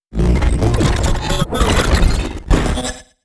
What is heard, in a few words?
portal glitch